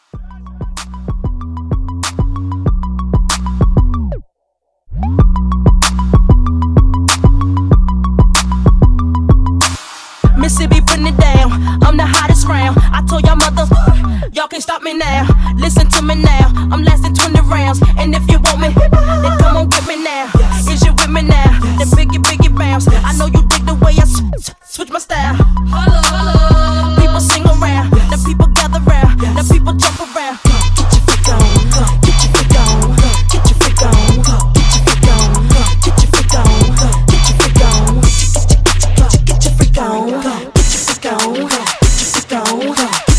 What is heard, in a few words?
Twerk Hybrid Mashup 95